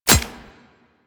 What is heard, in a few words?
Kinetic Plating Shield Hit; Warframe; Runner